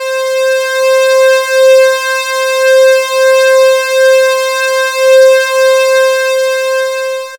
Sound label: Synth, Raw Analog Multi's; Dream Zone